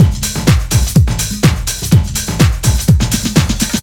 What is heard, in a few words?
thunderbird, Deep House 125bpm